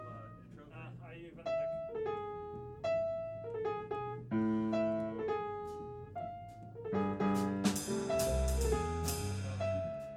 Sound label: jazz